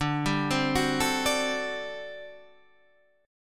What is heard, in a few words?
G Major 7th Suspended 2nd Suspended 4th